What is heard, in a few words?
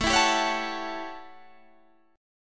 A 13th, Guitar Standard, chord; A13 strummed; capo=3